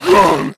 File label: grunt, zombie; creatures